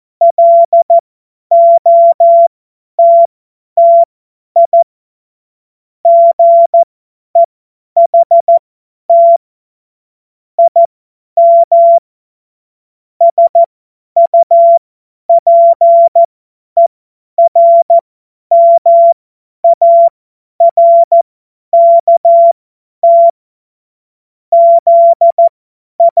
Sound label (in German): Witz, Morsekurs, cw morsen